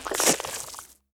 small, slime

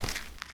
sand, dig; HunterZ G4; minecraft

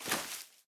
grass, dig; HunterZ G4; minecraft

